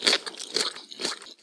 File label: eat sitting